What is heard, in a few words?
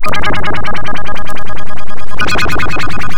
fx loop, big beat; Big Beat Samples & More; AKAI; FX LOOPS KIT